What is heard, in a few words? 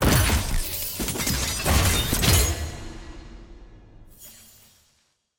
tier wings promotion; ranked; silver